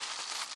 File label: climb; fratelli; theGoonies; Retro